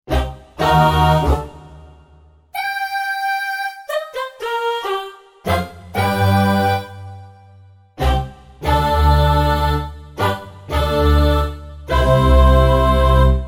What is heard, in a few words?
Stereo Voices